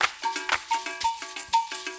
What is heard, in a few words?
120 CLAPS